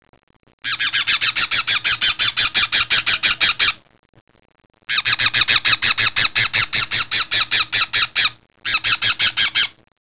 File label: SQUAWK